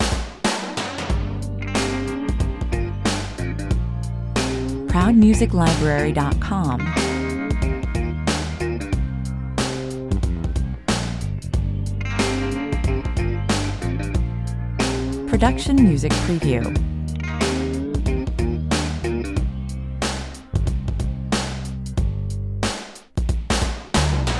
A mid-tempo, raw and aggressive, 90’s alternative-rock track with contrasting parts, 92 bpm; A mid-tempo, raw and aggressive, 90’s alternative-rock track with contrasting parts that dynamically transition from soft to loud. Angst ridden